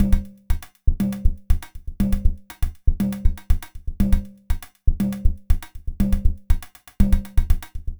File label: Minimalism, Processed Percussion